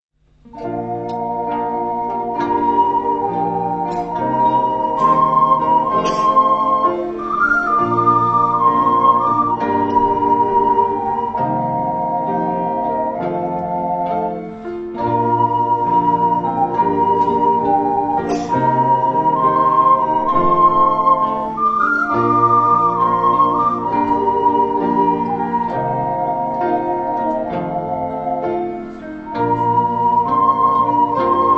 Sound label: Ocarina Festivals